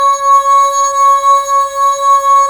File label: SYN, Roland JD 800; Sound & Vision - Gigapack I CD 2; JD 800/990